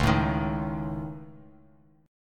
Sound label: Db Suspended 2nd Sharp 5th Db Bass Standard; strummed; Dbsus2#5; JGuitar